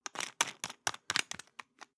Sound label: toothpaste screw cup close fast hard; Bathroom; haus-garten; badezimmer